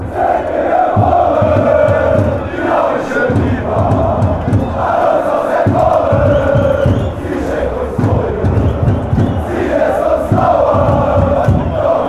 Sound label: chant, fan chants; eintracht